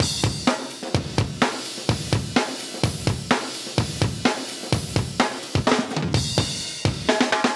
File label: 127 live breaks